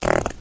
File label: fart short